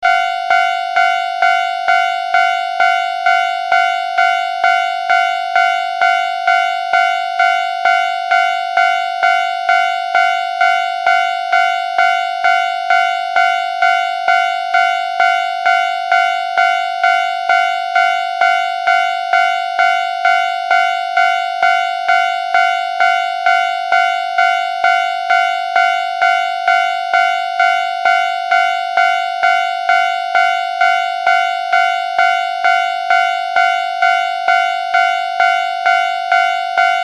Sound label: fumikiri, railways